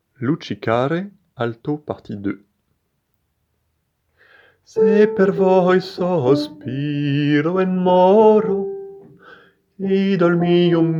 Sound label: Luci care alto partie; W.A. Mozart; Luci care luci belle-2° partie